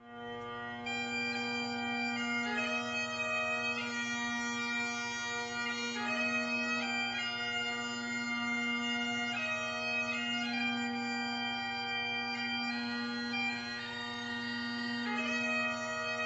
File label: bagpipes